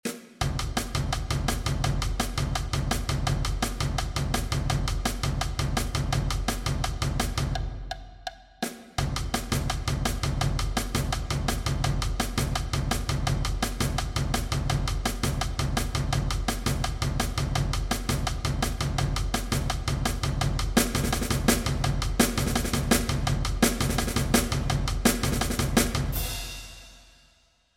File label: perc